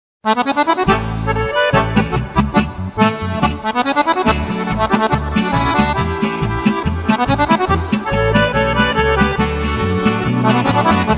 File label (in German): goldene harmonika